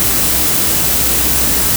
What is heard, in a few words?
AC hum; Noises, Organics; Xfer Records Serum; Serum Presets